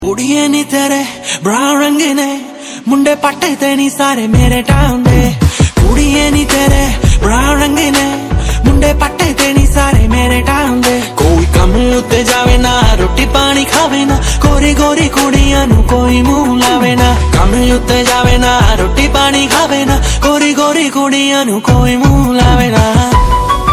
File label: New Panjabi Song